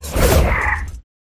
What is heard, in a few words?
Rat